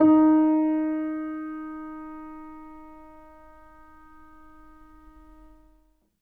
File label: MONO, Acoustic Piano; InVision Interactive; Miroslav Vitous